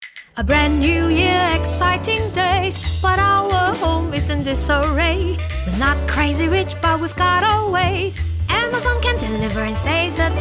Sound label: CNY Jingle English; Amazon